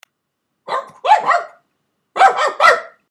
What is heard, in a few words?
bark